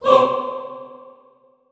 HOO C3, Classical Choir SHORT HOO; Best Service; Extended Classical Choir

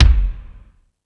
Sluggo Drums, Drum Machines

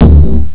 drummy; Amiga Soundtracker Sample Packs

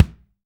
BD, DR BD; Best Service Gigapack 2

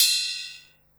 RIDE, 180 BPM; Ueberschall; Drum & Bass Resonance

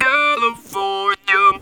TB CALI, Talk Box

